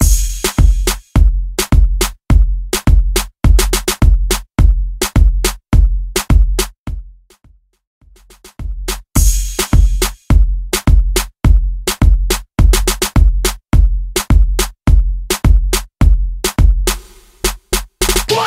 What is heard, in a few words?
Moombahton Quick Mix 105 Bpm